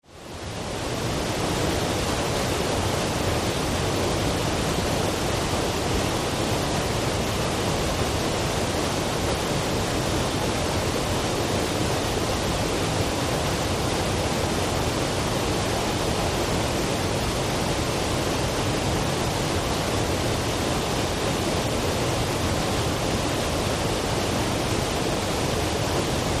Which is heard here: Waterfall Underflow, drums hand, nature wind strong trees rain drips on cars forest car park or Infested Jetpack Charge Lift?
Waterfall Underflow